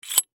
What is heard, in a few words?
Bolt Slide Close, Metal; Machinery; Machines; BlastWave